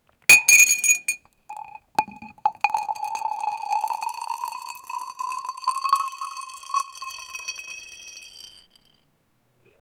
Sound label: drink swirling in a glass